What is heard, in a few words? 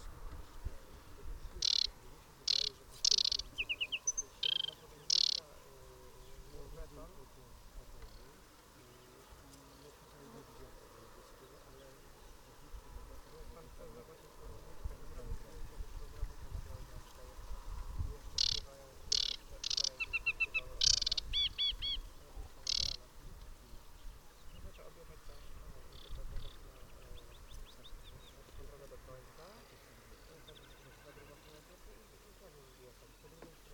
aquatic warbler